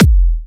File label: Electro Kicks